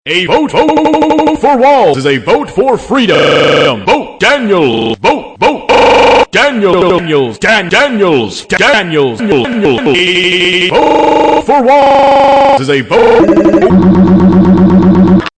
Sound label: horn